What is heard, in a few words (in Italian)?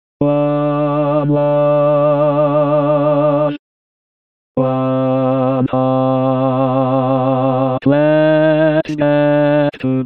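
ten bas cantato, tenor bass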